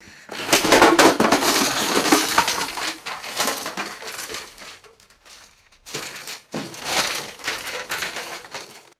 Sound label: hollow plastic pvc pipes knocked over and rummaged